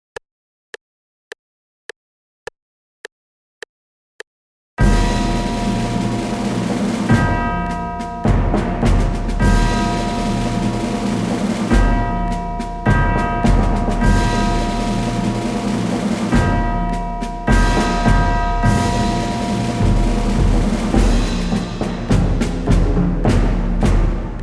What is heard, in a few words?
with click